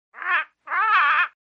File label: squawk; Animal Sounds; Various Animals; Short calls